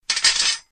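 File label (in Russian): скрежет по металлу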